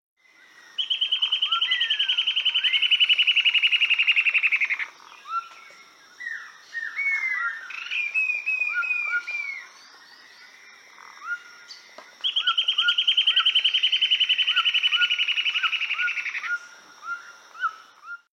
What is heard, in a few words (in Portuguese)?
canto do papa formiga de sobrancelha